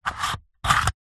foley battery test meter plastic slider holder slide